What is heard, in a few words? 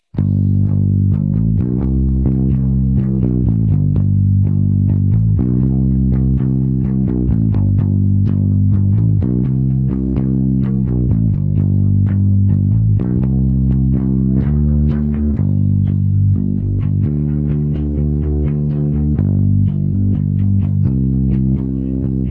bass